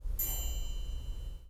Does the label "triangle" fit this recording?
Yes